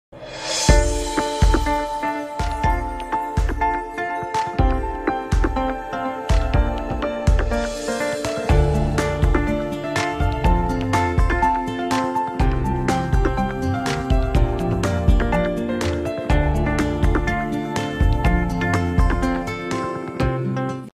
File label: Romantic Ringtone